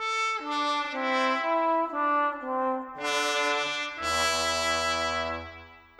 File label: Brass; Sonic Foundry (Sony Creative Software); Orchestral VOL-2; Modern 05